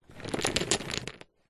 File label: jenga wood blocks tip from box onto carpet; leisure game